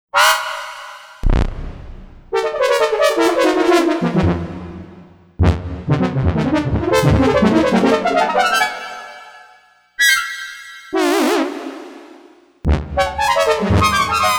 Andromeda Fanfares

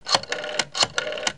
dialing

